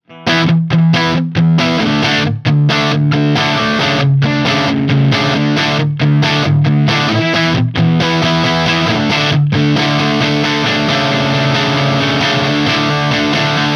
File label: TC15 DIRTY Mesa V30